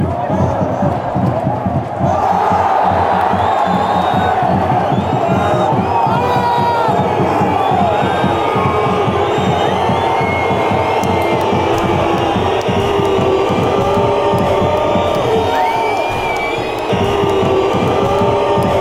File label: boo standard fanchants